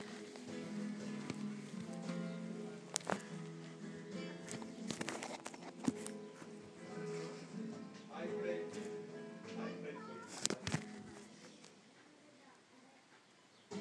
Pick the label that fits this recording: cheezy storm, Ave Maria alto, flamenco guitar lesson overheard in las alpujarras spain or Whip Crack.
flamenco guitar lesson overheard in las alpujarras spain